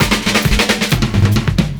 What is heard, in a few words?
FILLS, 70_s Breakbeats